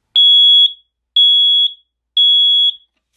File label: Smoke alarm beeps